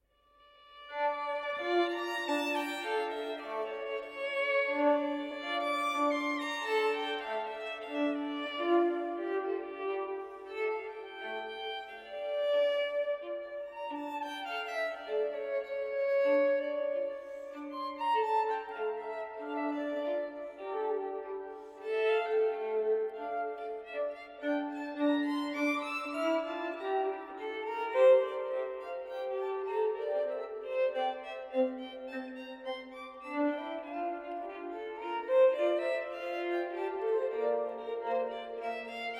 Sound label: sonata in F major andante, suonate a 2 violini; Vivaldi; da camera da suonarsi anche senza il basso